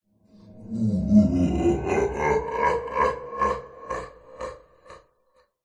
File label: horror demon devil evil laugh processed; zapsplat